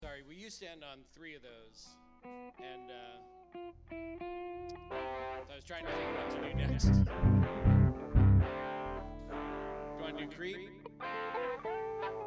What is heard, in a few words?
Strings Mix